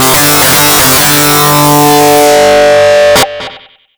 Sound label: DISTLOOP, Big Beat Samples